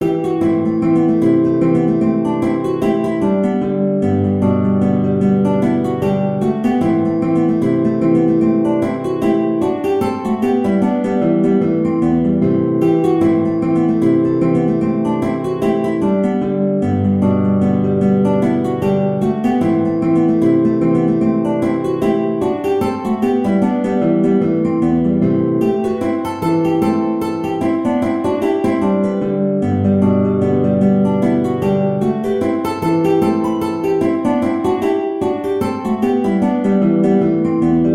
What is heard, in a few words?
Swallowtail 2 guitar